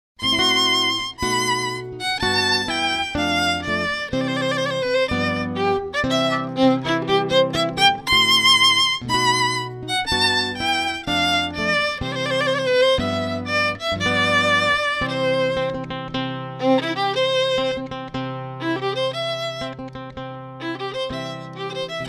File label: bach wedding march